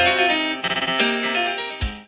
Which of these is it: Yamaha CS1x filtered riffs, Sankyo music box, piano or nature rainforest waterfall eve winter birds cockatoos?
piano